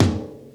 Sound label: Tom High Rough; Drums